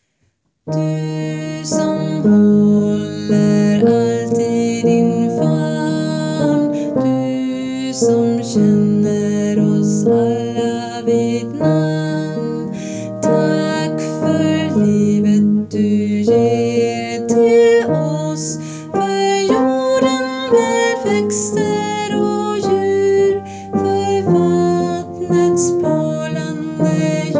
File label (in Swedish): bas; Tack; tacksagelse